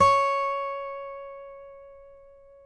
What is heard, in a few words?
COLLINGS C#5, Guitars; Spectrasonics; Hans Zimmer Guitars VOL-2; FNGSTYLE PFM